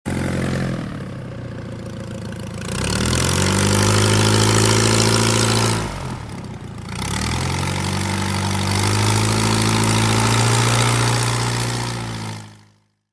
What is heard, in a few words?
mysterious noise